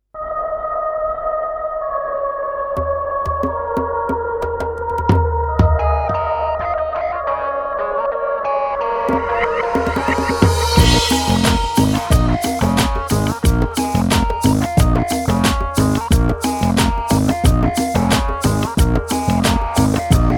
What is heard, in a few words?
okarina; sol